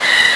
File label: Soccer Game Ambience; J-League Soccer Prime Goal 3